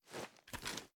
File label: reload rotate; gmod